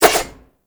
Spin Whoosh, Dual Daggers; Prime Okina

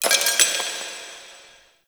Zariman Metal Debris; Warframe; The New War

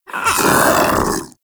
Infested Splitter Joined Vocal Attack Medium; Grey Strain

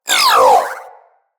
Zanuka Companion Vocal Pain; Pets

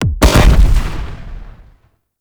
Rifle Projectile Explosion; Weapons; Warframe; Corpus; Ruban

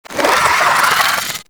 Vocal Attack; Amalgam Moa Satyr

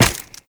Run Ice, footsteps; Warframe; Tenno

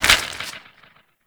Grimoire Mech; Warframe; Tenno

